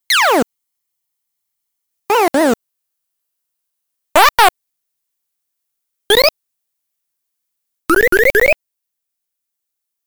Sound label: Sound Effects; Galaga; arcade